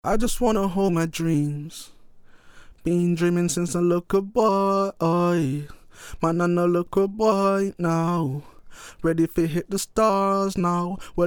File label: vocal after; waves